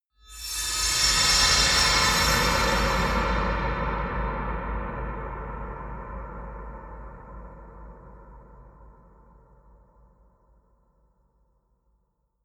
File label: metal bright reverb; maschinen; soundpool